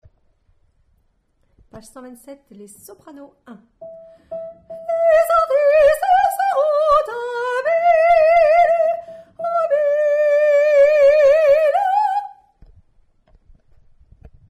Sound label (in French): Soprano1